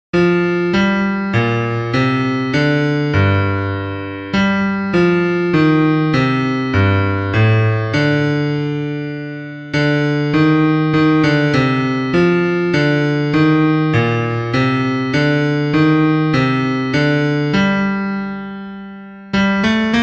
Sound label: Bass